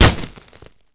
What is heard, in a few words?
glass, bullet impacts; Soldier Of Fortune II Double Helix; XBOX